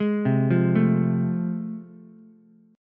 A Minor Major 7th Sharp 5th, Ukulele; arpeggiated; AmM7#5; JGuitar

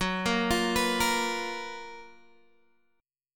F sharp Major 11th Guitar DADGAD

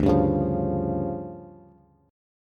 D Minor Major 7th Double Flat 5th, D, Bass; DmM7bb5; strummed; JGuitar

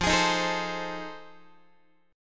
F 7th Sharp 9th, Guitar; F7#9 strummed; x,13,11,13,13,11

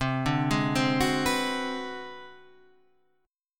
Ab 7th Sharp 9th Flat 5th, chord

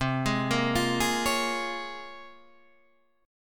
Bb Minor 7th C; Bbm7/C arpeggiated

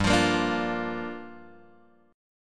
G Minor 7th, Guitar, Open G

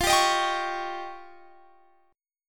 F 7th Suspended 2nd Sharp 5th, Guitar, Standard; F7sus2#5; strummed; JGuitar

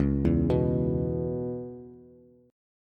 C sharp Minor 7th C sharp Bass; C#m7; arpeggiated; JGuitar

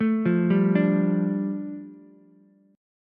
G 7th Suspended 2nd, Ukulele; arpeggiated; JGuitar

